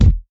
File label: perfect bass drum sound E key; kick drum samples